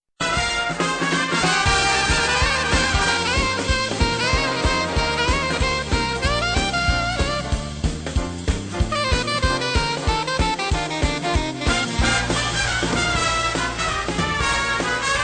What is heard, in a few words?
Trumpet Medley